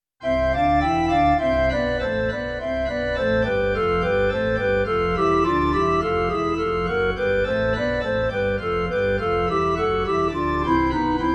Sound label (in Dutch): Kerkorgel, roland v accordion church organs